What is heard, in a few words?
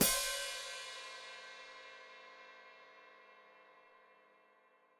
Hat Closed Open, Hi-Hat